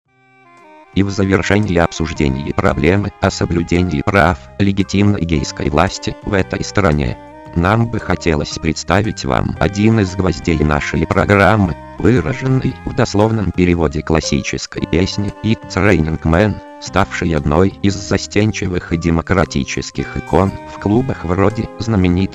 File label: Jingle